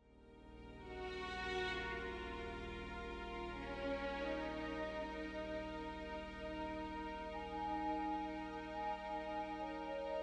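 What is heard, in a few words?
dj violins, ROLAND S-760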